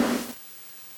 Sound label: SNARE, NES DPCM